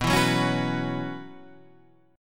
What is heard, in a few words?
B Suspended 2nd Suspended 4th; Bsus2sus4 Chord {7 7 4 6 7 7} strummed